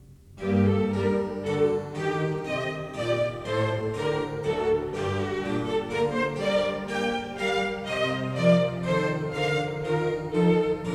Water Music, Suite No. 1 in F Major Minuet; Handel; HWV 348 IV.

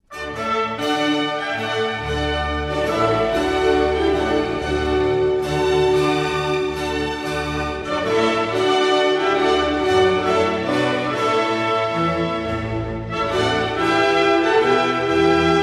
Orchestra, Water Music Suite In D Major Loure; HWV 349.; lentement

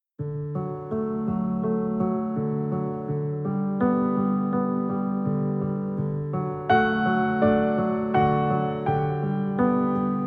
Easy Listening Piano, Relaxing Piano Music

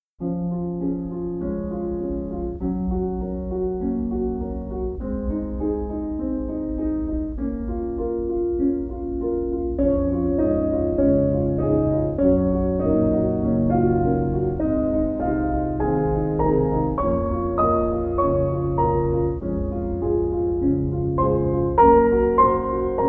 Bar Lounge, Relaxar Piano

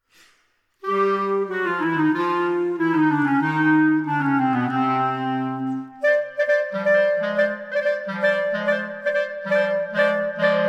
Clarinet Quartet, Tango

